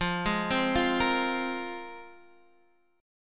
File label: A sharp 7th Suspended 2nd F, guitar